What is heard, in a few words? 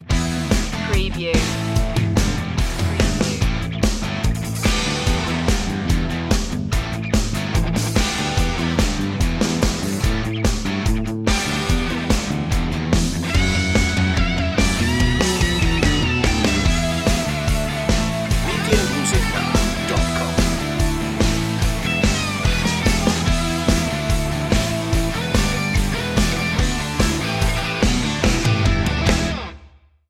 instrumental